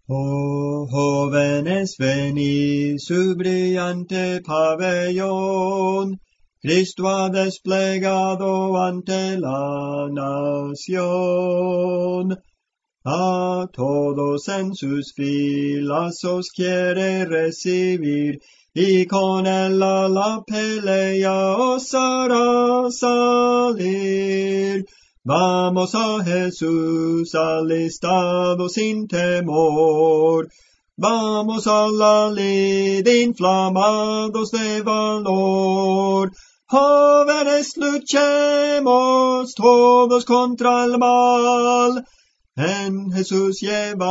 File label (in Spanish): Tenor, Cantos Espirituales; 020 ¡Oh Jóvenes Venid!; Clase del Canto